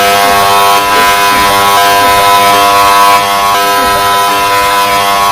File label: mic broke